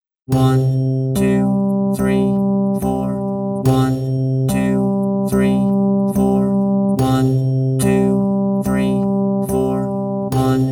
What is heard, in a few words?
example of quadruple meter